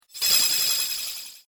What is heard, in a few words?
Magical Spell Shimmer Blast; fantasy sound effect; Magic Sound Effects